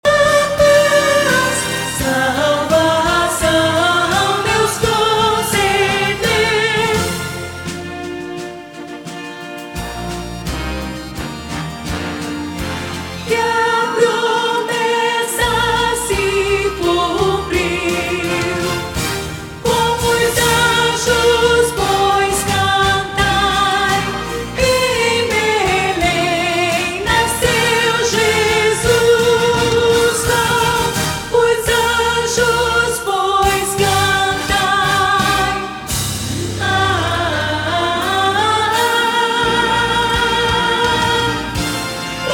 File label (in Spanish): Contraltos; cantata navidena